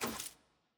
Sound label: record next click; caitlyn